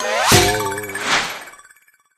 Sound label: Level start